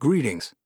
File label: human; wagic; mtg